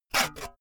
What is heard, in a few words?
saw use short unsteady